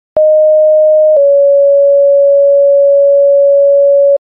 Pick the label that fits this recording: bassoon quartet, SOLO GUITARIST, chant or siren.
siren